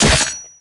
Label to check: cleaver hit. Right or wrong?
Right